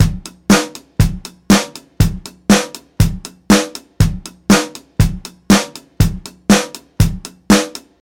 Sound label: 120 bpm drum loop sample d key, Free breakbeat - kick tuned to the D note. Loudest frequency: 1540Hz